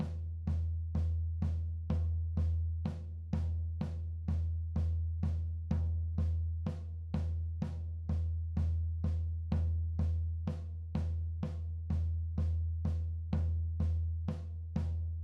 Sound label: Gym Drum; unsighted